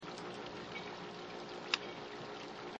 clang halyard 2300Hz

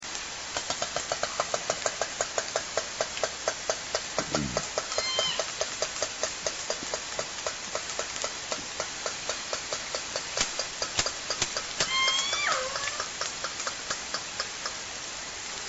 click grunt call sequence, orca

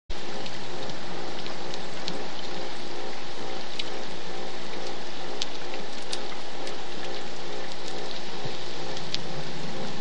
harbor seal

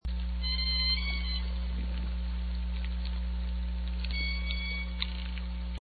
more Kpod like squeaks

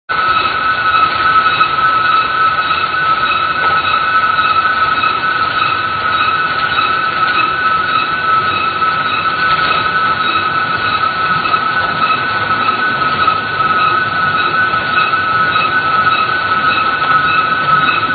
ship squeaky siren

